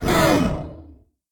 nightmare hit; Crypt of the NecroDancer

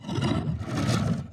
worm breathe, creatures; Factorio

